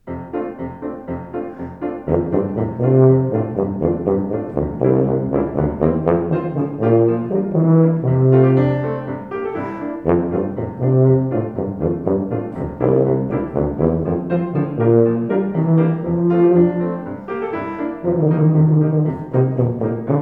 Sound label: Suite for Tuba and Piano; Suite No. 1 for Tuba and Piano; Effie Suite; Alec Wilder